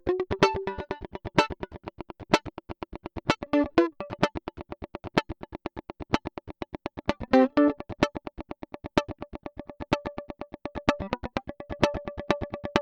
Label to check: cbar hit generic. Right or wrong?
Wrong